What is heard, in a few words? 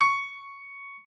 piano